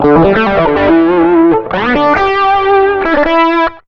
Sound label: SOLO R, Electric & Acoustic Guitar Loops; USB Soundscan vol.04; AKAI; 120 SOLOS